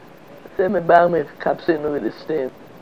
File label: ba mi instrumental